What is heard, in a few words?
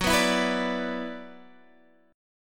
Gb 7th Suspended 4th Sharp 5th Gb down Guitar Standard; strummed; JGuitar